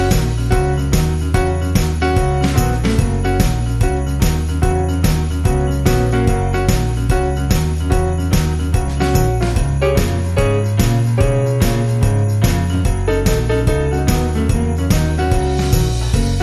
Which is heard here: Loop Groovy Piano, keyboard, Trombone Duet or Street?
Loop Groovy Piano